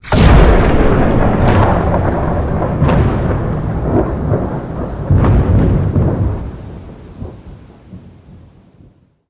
death explosion; thunderking

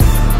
dripped

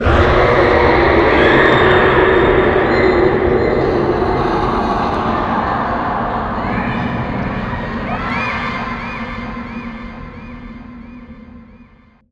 viro death; trespasser

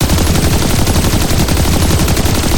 bolt shoot

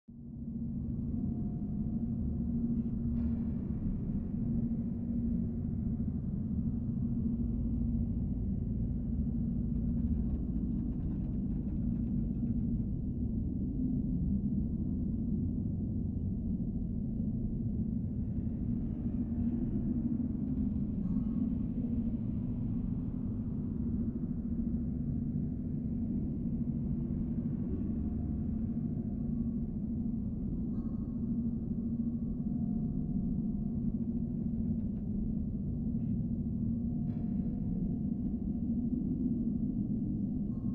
spooky ambience

